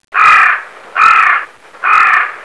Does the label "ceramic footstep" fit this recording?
No